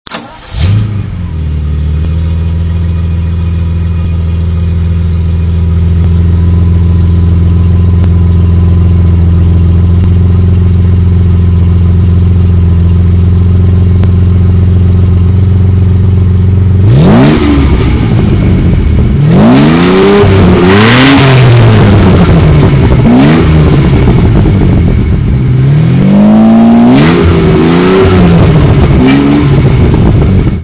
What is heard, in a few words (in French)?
Ferrari 550 maranello point mort